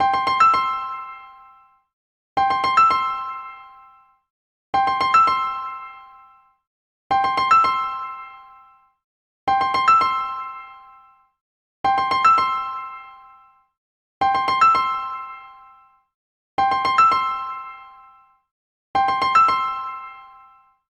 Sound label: jingle; LG; Optimus L90 D415